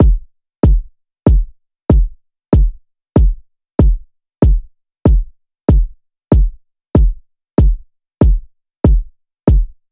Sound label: Drum Loop 95 BPM Kick